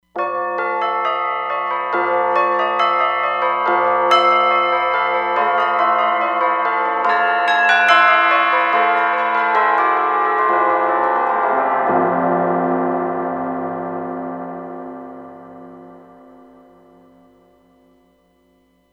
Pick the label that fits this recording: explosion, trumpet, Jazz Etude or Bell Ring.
Bell Ring